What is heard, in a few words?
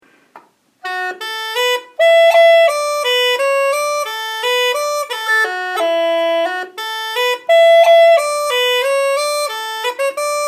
Old Concertina Reel; John Kelly's